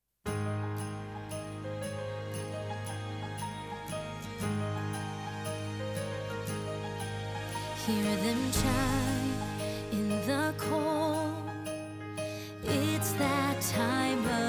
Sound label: The Bells Soprano; christmas 2025